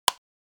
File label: Light switch toggle click